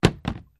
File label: Objects Fall Various